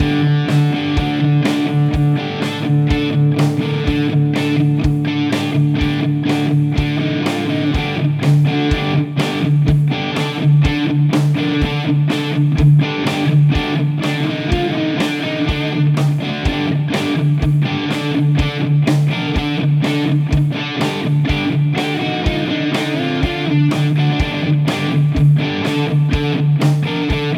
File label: Schecter Sun Valley Shredder Both